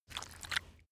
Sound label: step mud slow normal; schritte